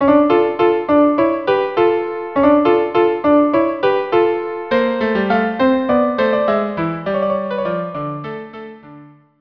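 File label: viola cello; Choeur des Gamins; carmen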